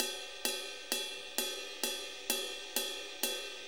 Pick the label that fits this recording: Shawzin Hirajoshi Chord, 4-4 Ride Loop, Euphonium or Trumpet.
4-4 Ride Loop